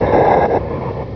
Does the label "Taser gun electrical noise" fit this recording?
No